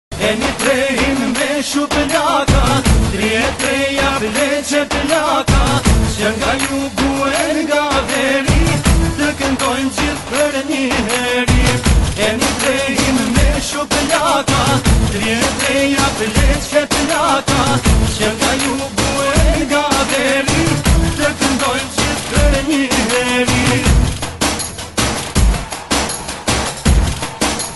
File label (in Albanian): Muzike Shqip